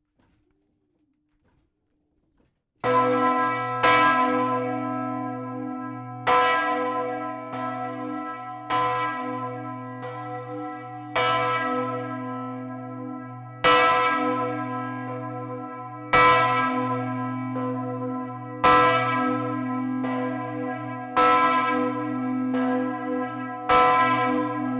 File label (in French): Ettiswil cloche; campanologie; Willisau (LU); Assemblée générale 2013 de la GCCS à Willisau (LU)